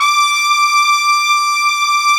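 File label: trumpets section; Roland L-CDX-03 Disk 2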